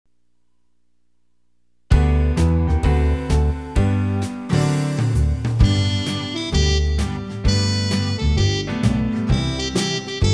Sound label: instrumental